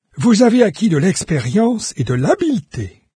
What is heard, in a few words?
levelup; Fate The Traitor Soul